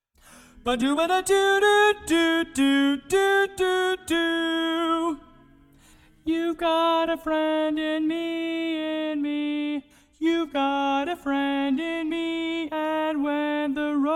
tenor SOLO

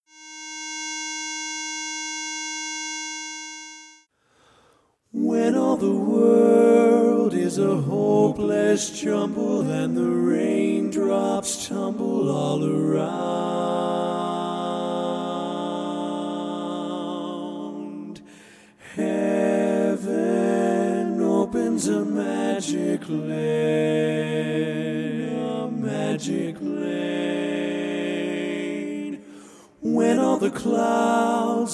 Bass missing

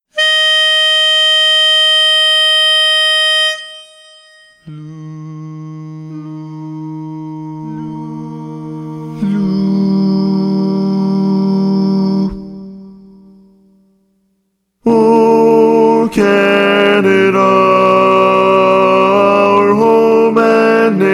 O Canada Bari mono

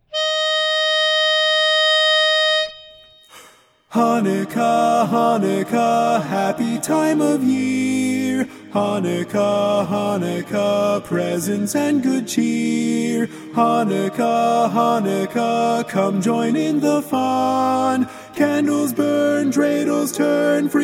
Chanukah, Chanukah lead mono; Christmas

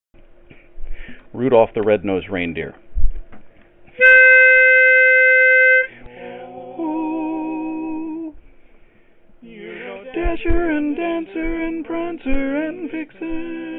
Tenor; Christmas

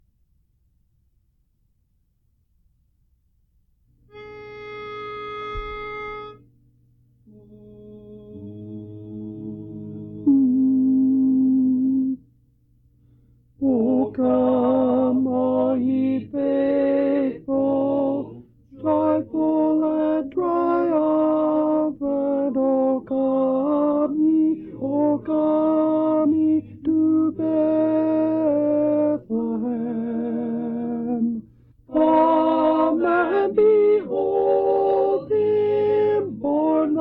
O Come All Ye Faithful Tenor; Christmas

